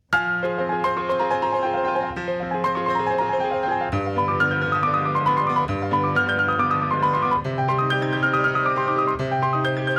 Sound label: Piano clipped